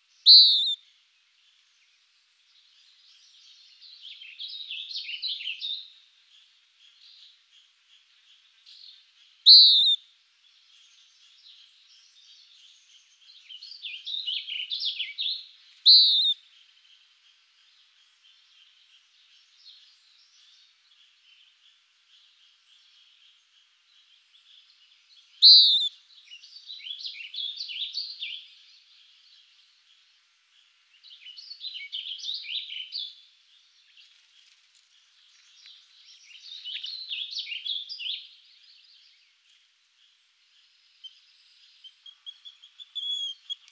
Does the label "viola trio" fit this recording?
No